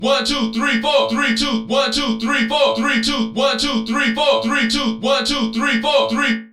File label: count beat; chromaris